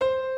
钢琴 C6; 现世的秘术师

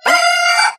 鸡叫; 电棍

